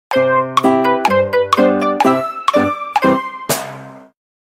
funny Question sound effect

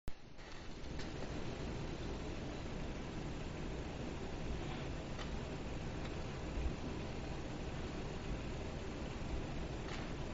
living room, ambience; house

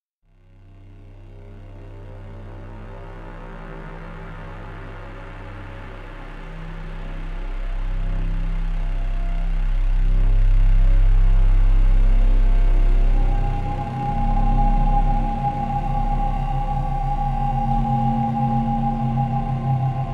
Creepy music and sounds

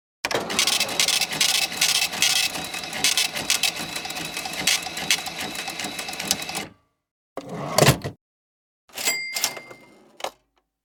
Cash Register Sound

